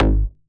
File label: slap